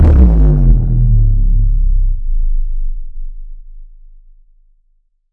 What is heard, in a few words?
boom effect on earth